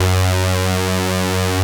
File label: POLY SYNTH; Wizoo - Powered Wave